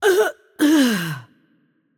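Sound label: Arabic Death; bloom minigame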